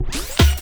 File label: SCRATCHES; Scratch 1